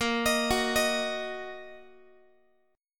A sharp Suspended 4th Sharp 5th A sharp down Guitar; x,18,18,16,19,x; 4A,4E,5A,5D,5G#,6C#; arpeggiated